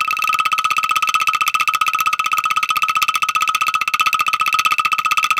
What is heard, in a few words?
ANGKLUNG D4; Spectrasonics - Heart of Asia CD2